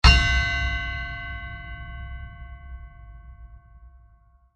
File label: Heavy Anvil Impact; Blasts; Impacts; Crafted Signals